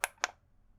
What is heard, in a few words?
flashlight click